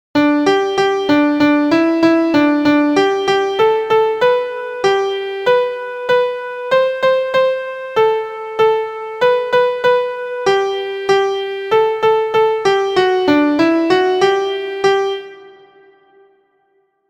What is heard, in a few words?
MIDI